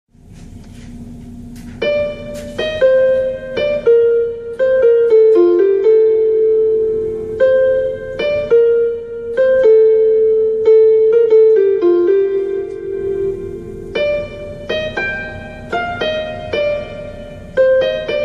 piano ringtone

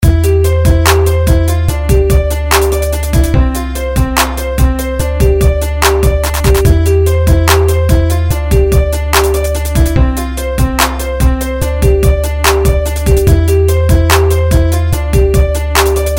remix ringtone; xiaomi

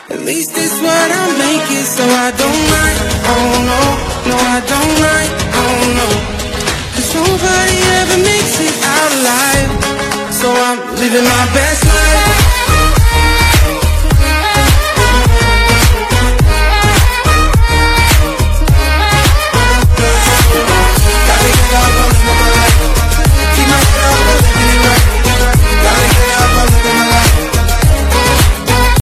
english ringtone; english ringtones

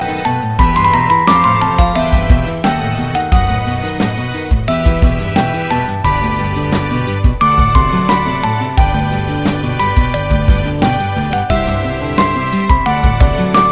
violin sonata ringtone

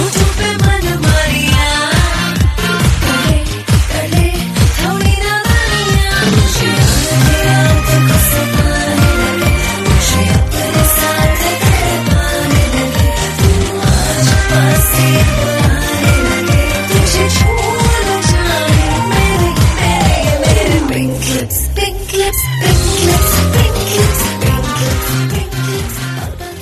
hindi music ringtone, bollywood

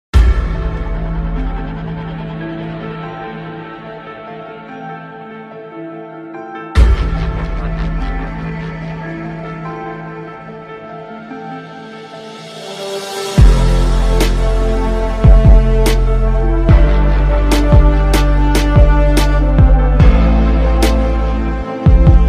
game theme ringtone

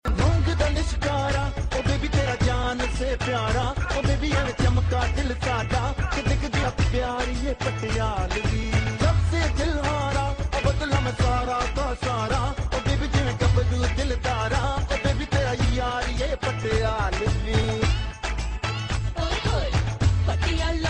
beat ringtone

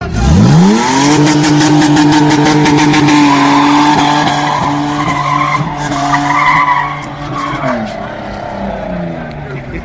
motor voice ringtone

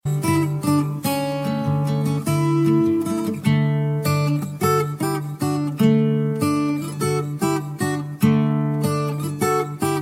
guitar cover ringtone

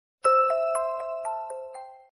sweet message alert tone

